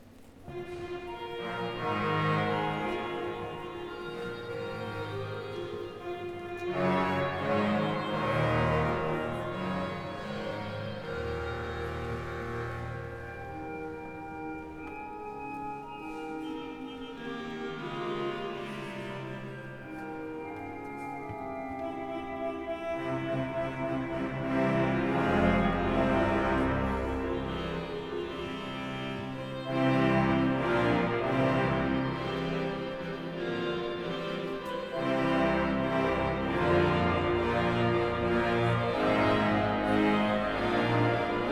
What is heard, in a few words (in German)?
Orgelvorspiel, St. Thomas; Straßburg